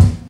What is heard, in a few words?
bumpin kick sound f key